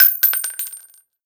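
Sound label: shell bounce small, weapons, shells; ModernWarfare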